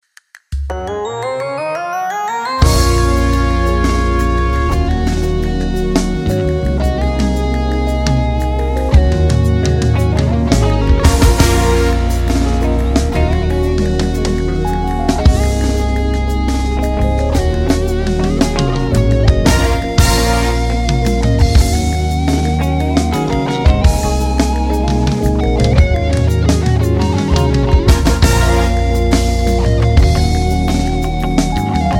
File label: Praise Choir